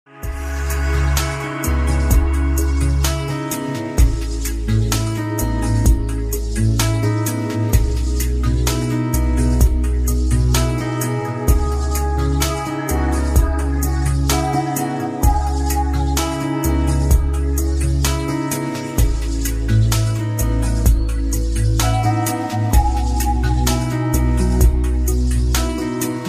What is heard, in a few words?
ambient remix